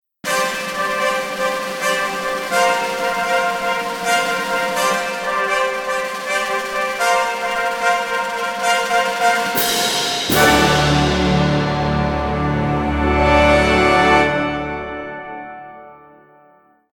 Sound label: Fanfare